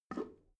drain valve open soft, Badezimmer; haus garten

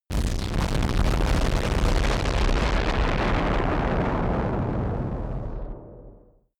rocket turbine fire power, raumschiff

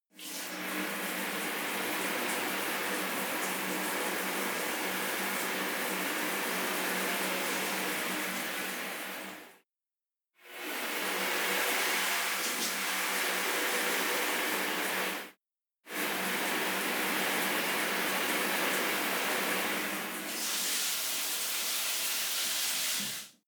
shower on glass, badezimmer

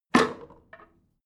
drain valve open heavy, Badezimmer; haus garten

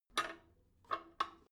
drain valve lever handle regular, Badezimmer; haus garten

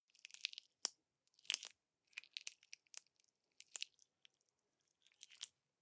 gel dispenser press squish long, badezimmer

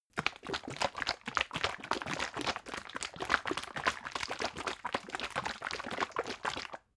bottle syrup shake slow, gastronomie

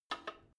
drain valve lever handle short, Badezimmer; haus garten

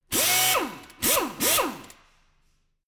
impactor salve, bohrer; maschinen

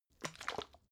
bottle syrup shake single viscous, gastronomie

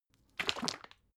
bottle syrup shake single, gastronomie

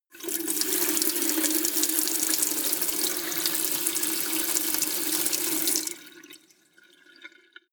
sink water, badezimmer

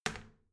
toilet seat wall open soft, badezimmer

